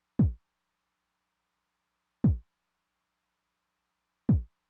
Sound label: kick drum, Roland TR-909; Roland TR-909 Ableton Project